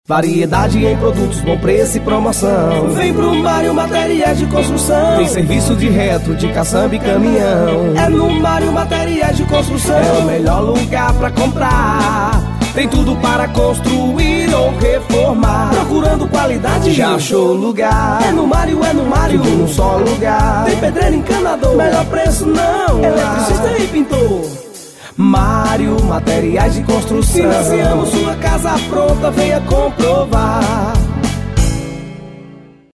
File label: JINGLE; MARIO MATERIAIS DE CONSTRUÇÃO